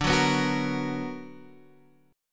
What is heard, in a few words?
B Minor Major 7th